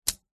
plastic clip mechanism click; foley; zapsplat

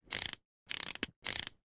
toy small fish wind up

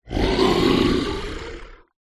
horror monster growl wide stereo; zapsplat

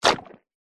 cartoon squelch wet drop; zapsplat

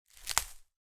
foley branch pick off small hedge

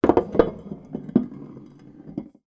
foley bottle wine roll across wood table

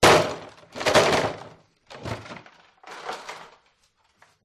pub plastic crate empty bottles handling; field reports

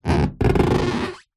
foley balloon inflated squeak rub; zapsplat

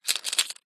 leisure poker chips handling short; zapsplat

